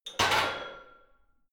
barbell heavy plates replace rack hard, fitnessstudio